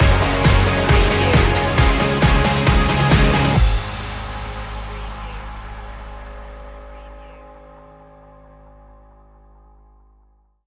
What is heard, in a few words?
rock drop and roll stinger